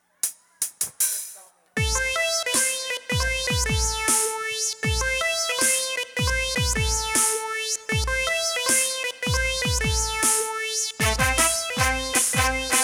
forró

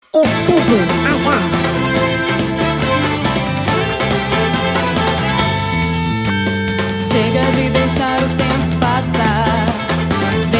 jingle otica; buss

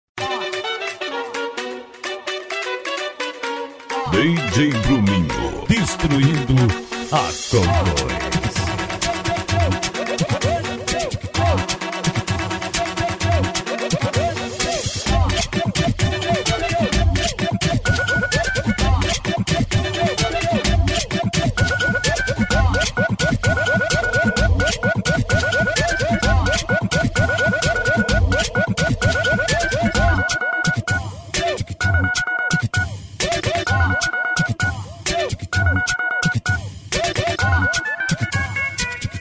aquecimento mega funk

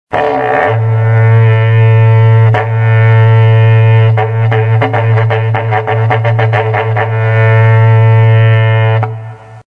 repiques de berrante; berranteiro

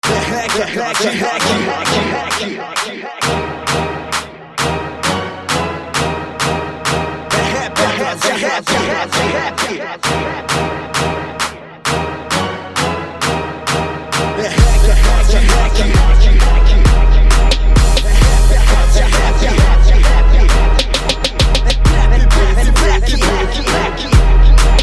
rap x trap

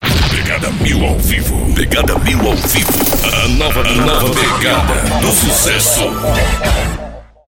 vinheta abertura forro

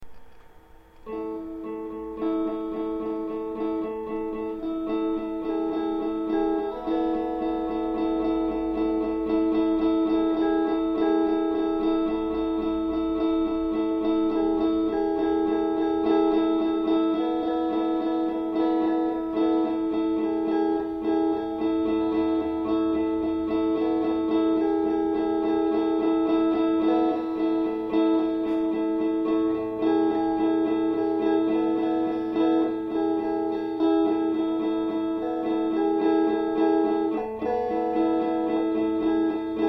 intrumental

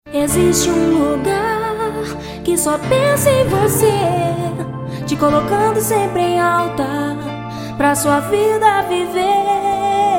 jingles hospital; santa genoveva

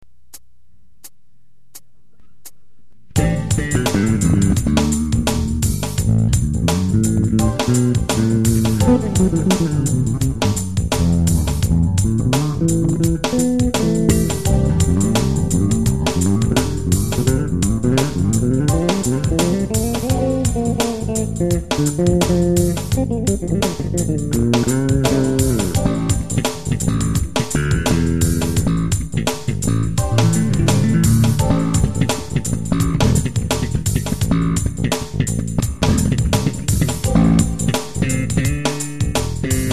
jam contrabaixo fusion

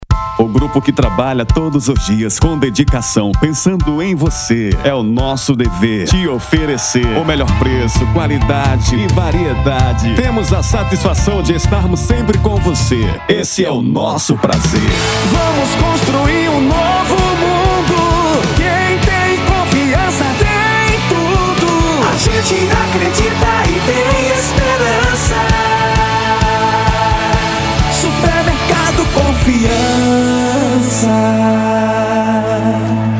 jingle supermercado; supermercado confianca; kzamstudio

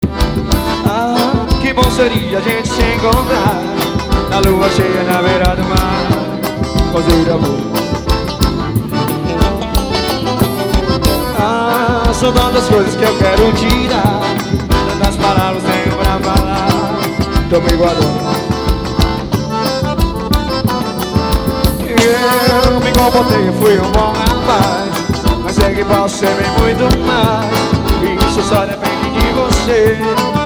forró, xote

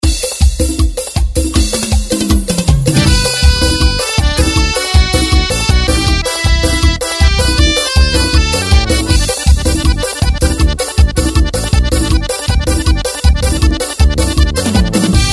ritmos novos

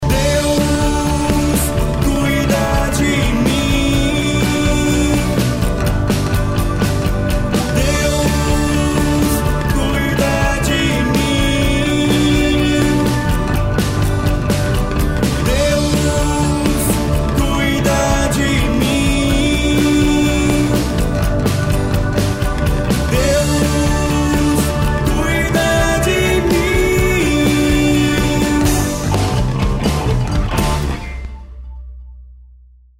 toque celular

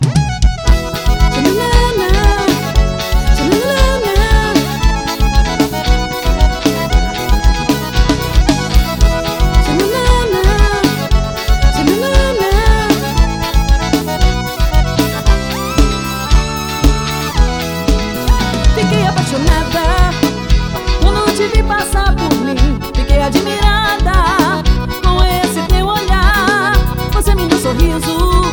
forro como antigamente

